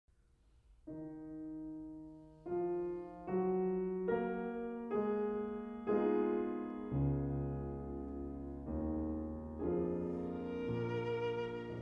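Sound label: violin sonata; lekeu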